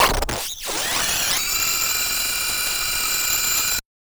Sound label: Casio, Glitched, malfunctioning synth